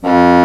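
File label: Contra Bassoon, F1; Digital Sound Factory Virtuoso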